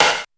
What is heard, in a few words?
Dance Snare